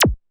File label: Dance Kit, Korg 01W